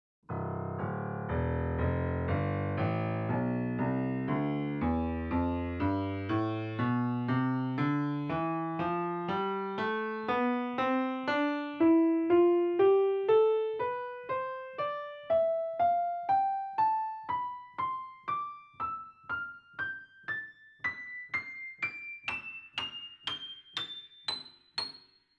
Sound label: scale orig